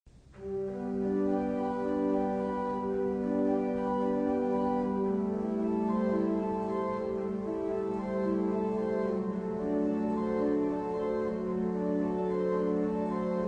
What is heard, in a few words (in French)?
Trompette